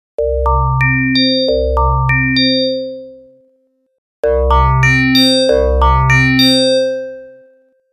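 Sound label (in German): Ring Modulation ohne und mit Saturation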